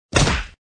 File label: Body Hit; lego ninjago spinjitzu smash